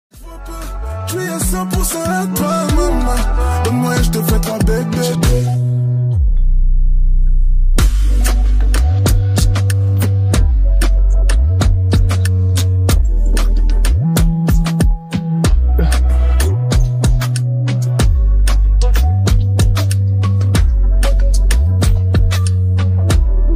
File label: Beat Hook OPEN VERSE Instrumental